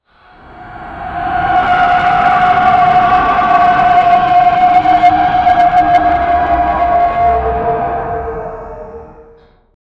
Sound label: unnatural scream of a cur, spectral